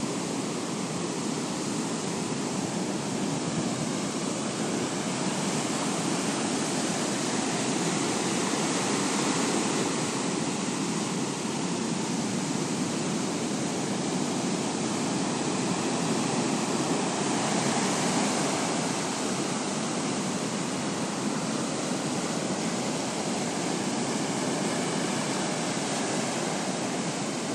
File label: peaceful ocean sounds, pacific ocean